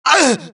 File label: death, dialogue, Allies; mohaa; Mission 1